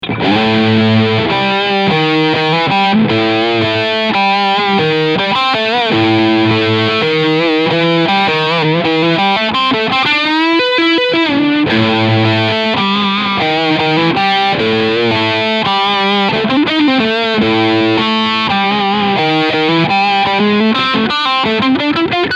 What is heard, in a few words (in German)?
Harley Benton Fusion II HSH Leadsound Steg Pickup